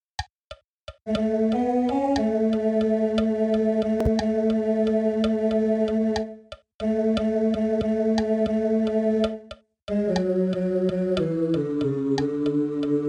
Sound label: BAS